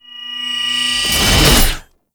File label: Strike Leap Charge Up; Boss; Enemies; Abilities; Warframe; Oraxia